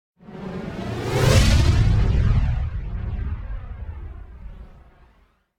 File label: Whoosh Explosion Fly And Destroy - Phased; Hollywood Edge; sound designer's toolkit v2